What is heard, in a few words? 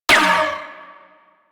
Uzi Layer; Warframe; Tenno